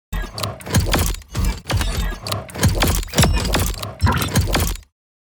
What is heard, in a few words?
Steampunk engine mechanical process various gears